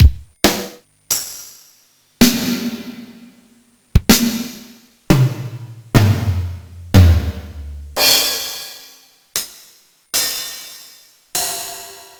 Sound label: Drumset Samples; Quiz Sekai wa SHOW by shobai